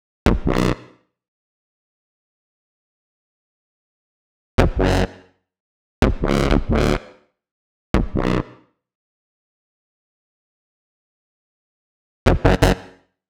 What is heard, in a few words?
125 Bm Bass, electro synths; Prime Loops; Complex Electro Synths; Precinct13